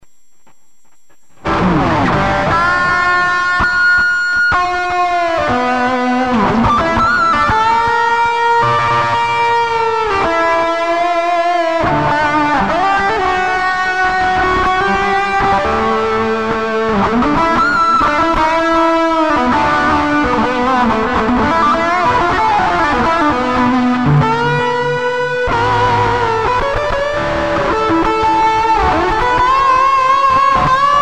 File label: guitar solo